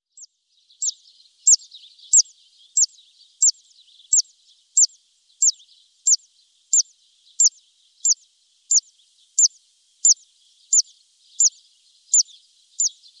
Grassangare, Faglar

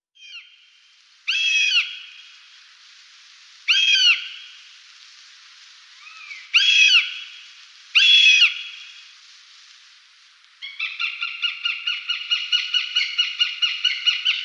Duvhok, Faglar

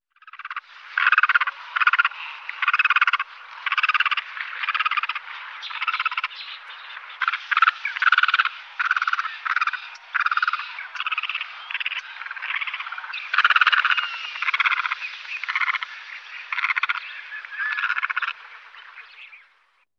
Agretthager, Faglar

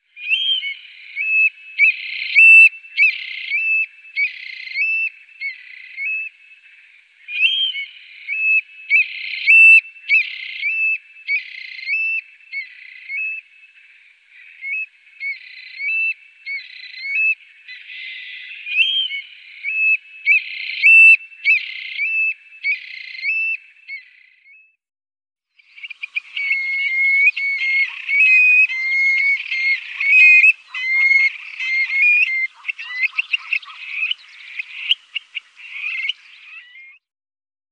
Tjockfot, Faglar

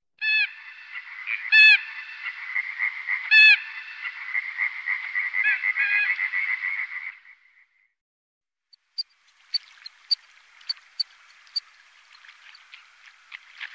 Sothona, Faglar

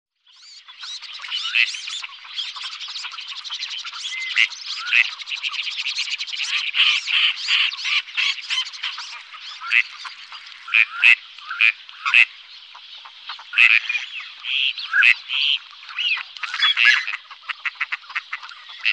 Snatterand, Faglar

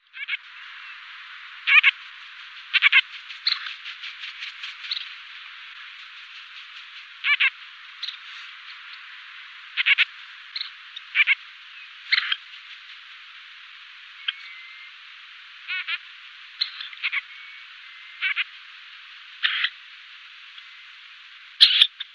Brushane

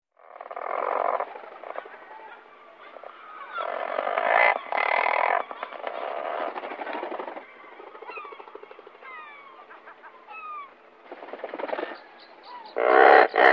Tordmule, Faglar

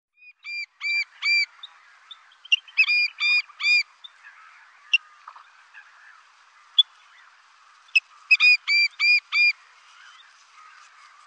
Smadopping, Faglar

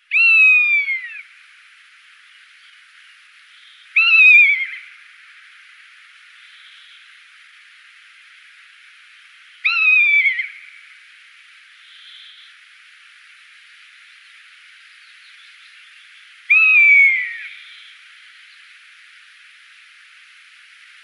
Fjallvrak, Faglar

